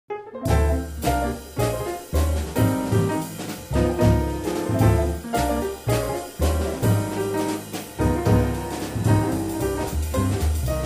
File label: strings